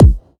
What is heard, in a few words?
natural kickdrum b key